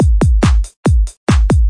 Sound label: 142 bass kicker clap; 141 to 160 bpm